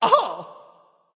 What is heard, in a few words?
grunt jump; smite; anhur